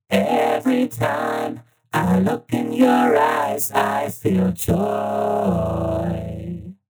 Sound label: Vocals Vocode Cylon Vox; Antares